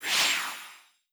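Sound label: Battle Vs Mode Out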